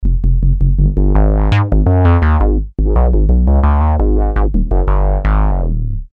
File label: polivoks bass line